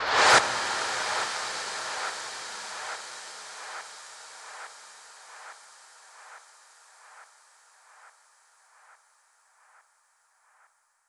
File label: Reverse 140BPM, Sound FX; Vengeance Samples; D minor